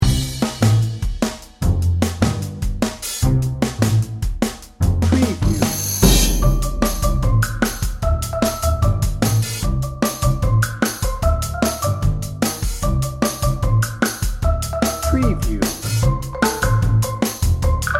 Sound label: 50s game show no strings